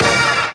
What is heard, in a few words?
super Meter Bet Level; mega joker